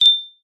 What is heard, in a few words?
multimedia notification bell chime ping ring tone